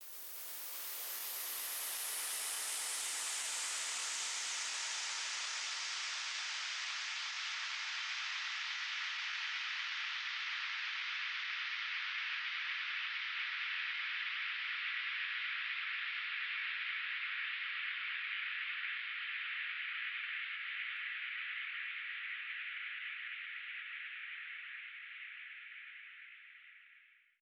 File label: SweepFx 140BPM; Sound FX; Vengeance Samples; Incast Kit; E minor